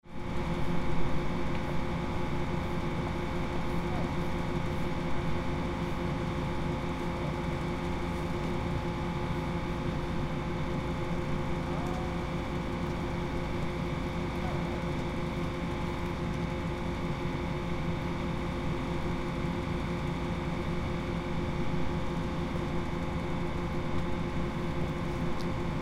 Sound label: decontamination building